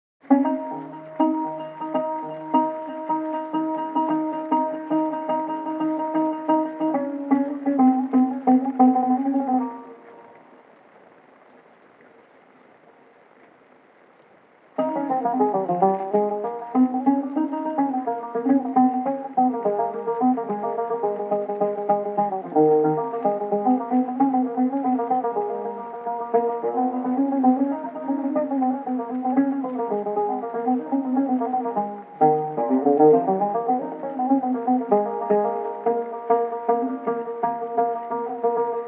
arabic oud

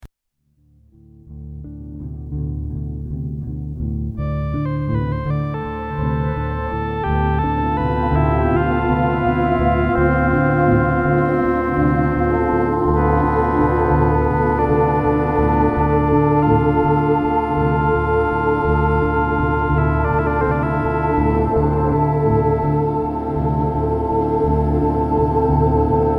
casiotone

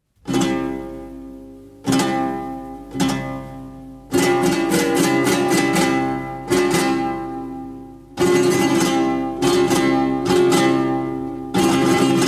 flamenco; 1951年から62年までに録音された音源を収録したフラメンコ・アンソロジー

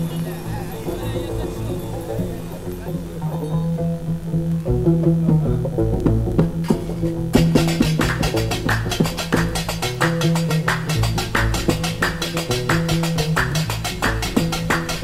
gnawa music; グナワ音楽

